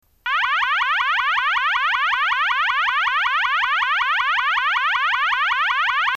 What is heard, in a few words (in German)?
ton, Signalgeraete